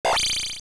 Sword Clang, Sword clanging against a wall or shield; AOL